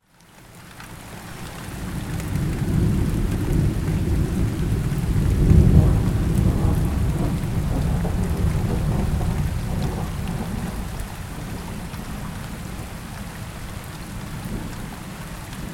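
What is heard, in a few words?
thunder with rain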